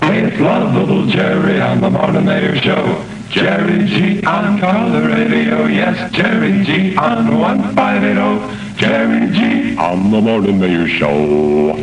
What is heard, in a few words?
jock jingle; loveable jerry g